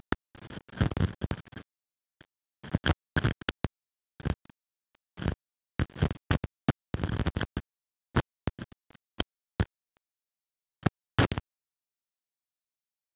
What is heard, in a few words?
amp